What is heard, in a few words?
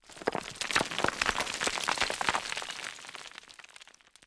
rockslide; environment; Tribes2